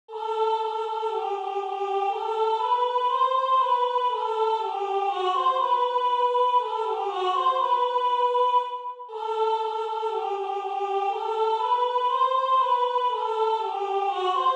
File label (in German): Sopran Alt; Lob des Lernens